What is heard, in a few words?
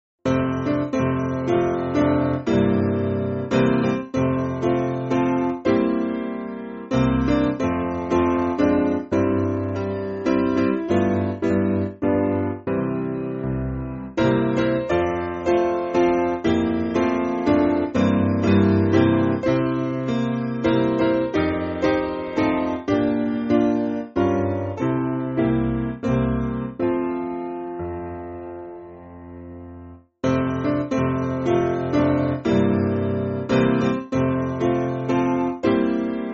Piano